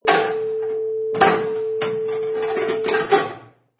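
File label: Kitchen Pots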